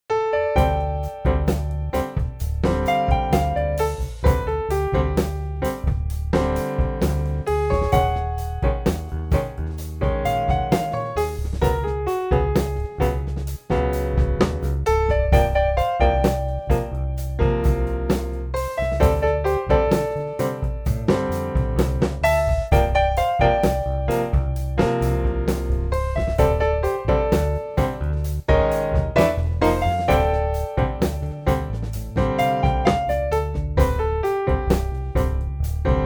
Modern Binary Jazz

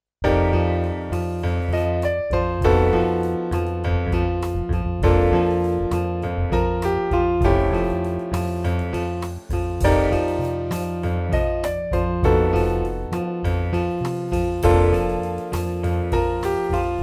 Pedal point; Jazz

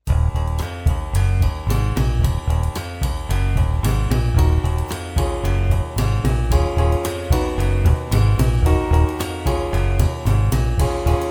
Mechanical blues; Jazz

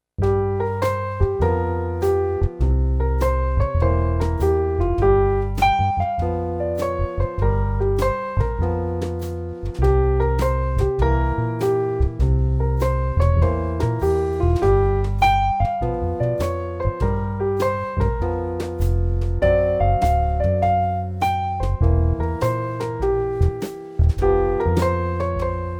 Morning blues

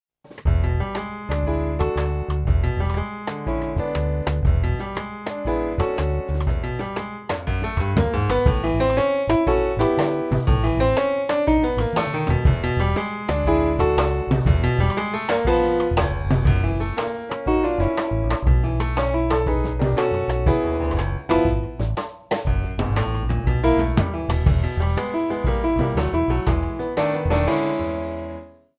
Left hand blues; Jazz

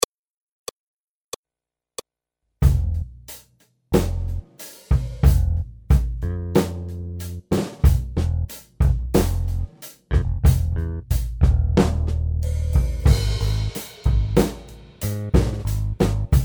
Monkey dance, Accompagnement seul; Jazz

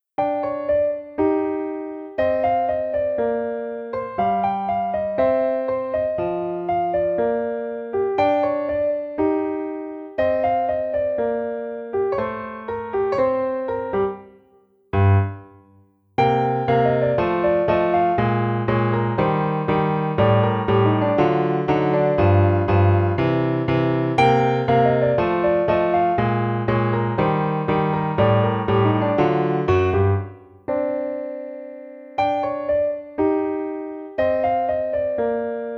A cool piece, Piano seul; Jazz

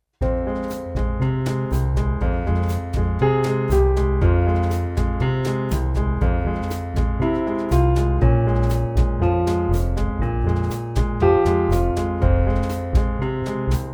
Green tango, piano

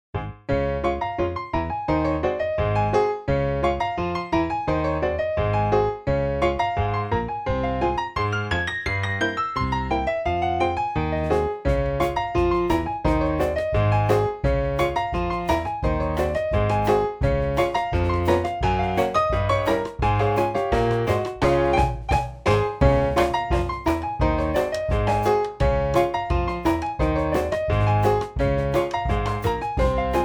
Frog legs rag, piano; Jazz; James Scott

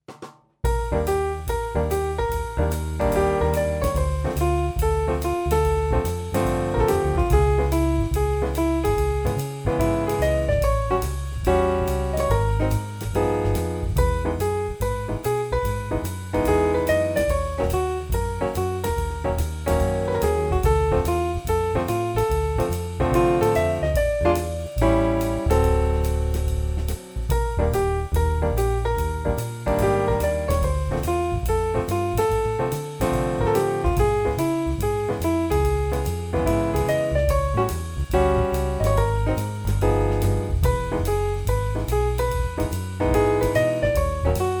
Jazz Keys

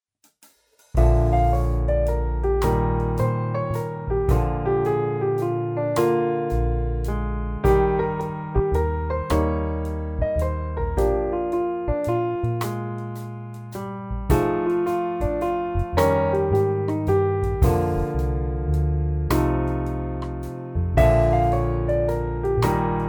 Waltz & blues

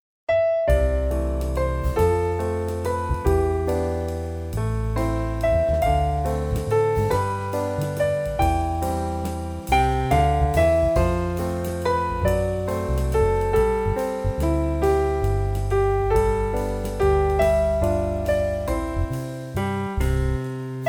Waltz; Jazz

